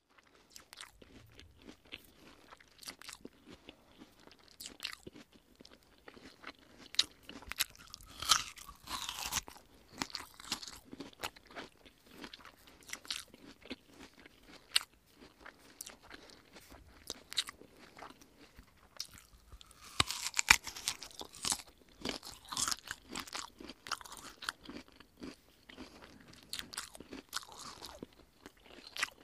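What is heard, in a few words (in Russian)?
поедание яблока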